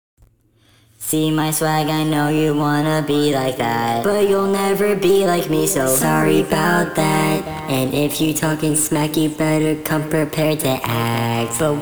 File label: vocals